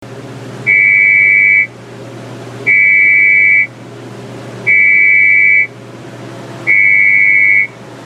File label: warble; emergency